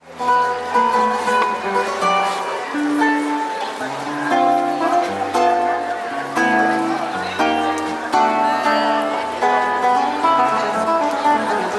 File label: tango san telmo